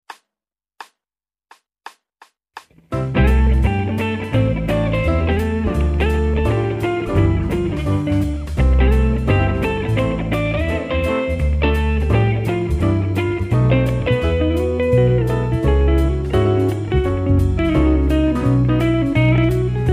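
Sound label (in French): Style jazz & fusion; guitare; PRS Cu22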